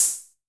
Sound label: hihat, Novation Bass Station 2